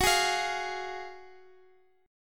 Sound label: F sharp Major 7th Suspended 2nd, F sharp, down, Guitar, Open F; strummed; JGuitar